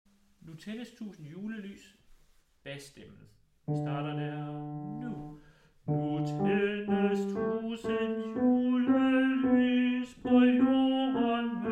bas; Nu tændes tusind julelys; harlev koret

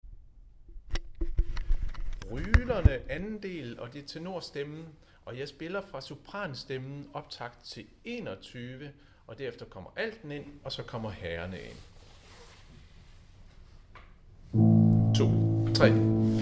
tenor; Rylerne 2.del

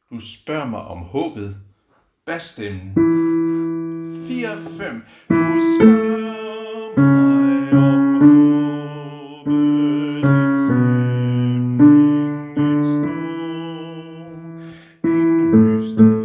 bas, koret; harlev koret

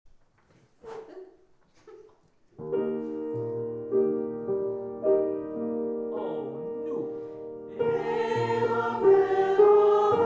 overstemme

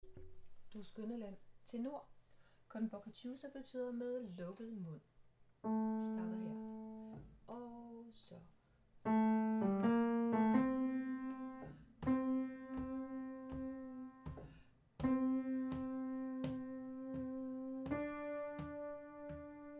TENOR; Du skønne land; harlev koret

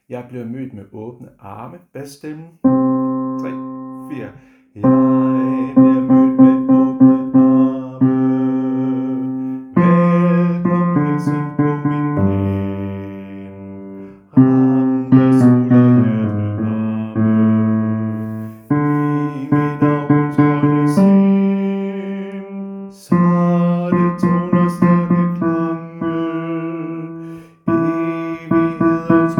bas; harlev koret

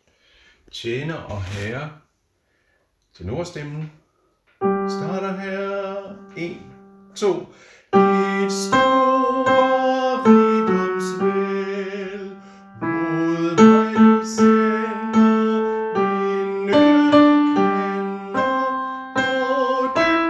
tenor; Tjener og Herre

